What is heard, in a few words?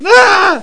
Scream; Blood Demo; PC Gamer IT CD 35